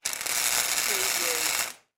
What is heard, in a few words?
Arc Welder